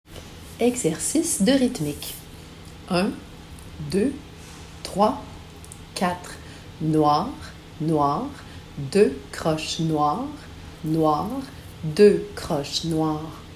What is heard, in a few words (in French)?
Rythme 5 6 ans